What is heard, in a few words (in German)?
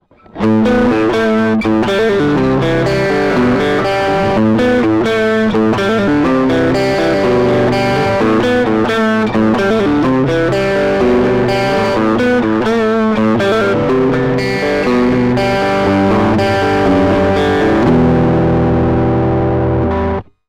halber drive picking; fame tube steamer